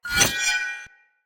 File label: Dagger Finisher Accent Front; Weapons; Dendrit